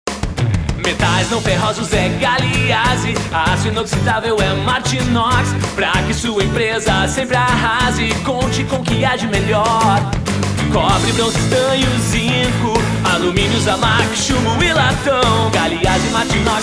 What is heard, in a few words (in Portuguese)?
Jingle; Galeazi Martinox